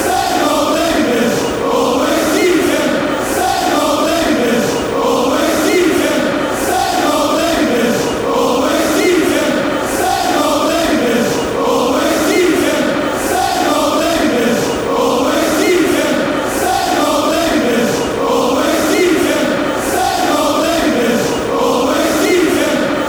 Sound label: same old english, chants